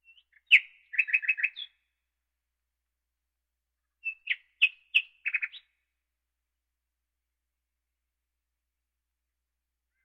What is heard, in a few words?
Birds